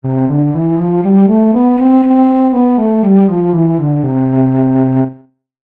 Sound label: octave down; テンポはそのままにピッチ調整; Pitch Switch